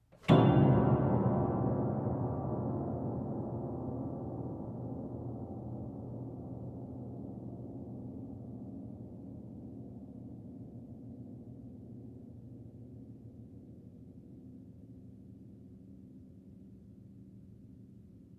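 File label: pressing the soft pedal with active sustain pedal on an old piano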